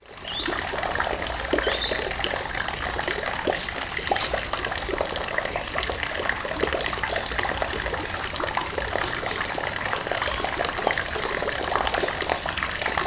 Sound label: nature water drip gutter into deep water after storm birds